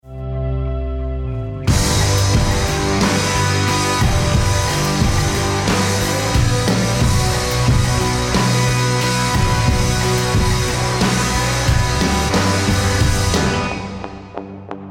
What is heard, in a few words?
bomb ten sec count